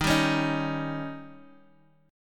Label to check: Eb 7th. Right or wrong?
Right